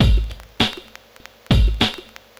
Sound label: drloop, drumloops, Vinyl Attack; Masterbits